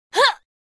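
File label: under attack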